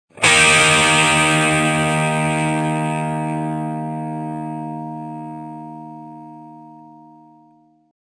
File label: guitar